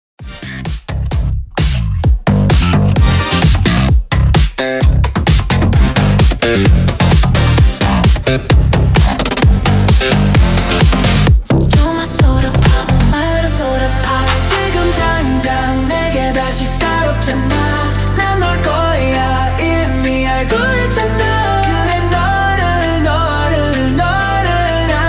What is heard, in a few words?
kpop remix 130 bpm; remixplanet